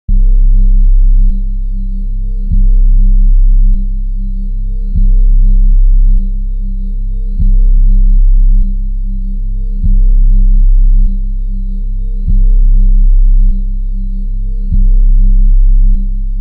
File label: floor clicks; maguett